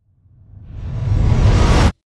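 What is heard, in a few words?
Trailer Hit Reverse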